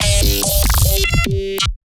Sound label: glitch